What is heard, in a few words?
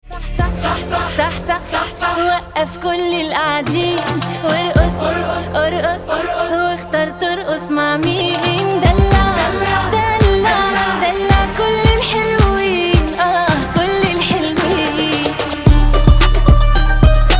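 Arabic trap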